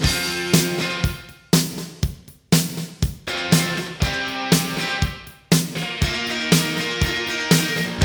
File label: riff